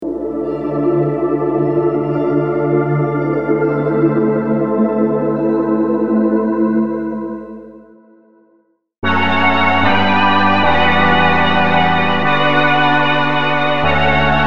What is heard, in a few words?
Chords Trap